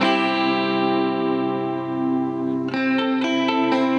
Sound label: Chords, гитары rock alternative